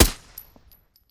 glock17 silence; EMXArms; pulse

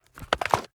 reload end, arms; EMXArms; umx32

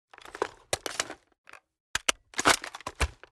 aa12 reload tactical; EMXArms; mg90

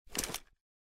glock17 draw; EMXArms; pulse

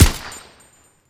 scar h silence; emx pmg90

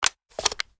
inspect mag out, arms; emx umx32

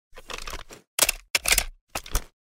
m4a1 reload tactical; EMXArms; rivet

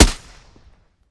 glock17 silence; EMXArms; pulse

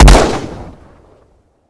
db shoot; emx scgraves

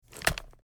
inspect raise; EMXArms; umx32

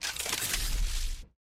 reload, arms; emx pmg90

